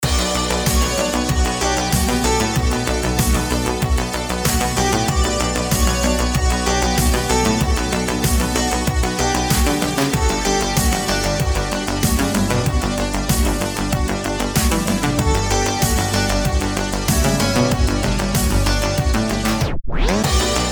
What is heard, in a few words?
dark synthwave